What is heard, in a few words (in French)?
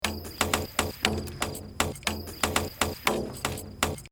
118 exterieur nuit